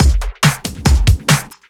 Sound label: Breakbeat; Vengeance Samples; VEC2 Loops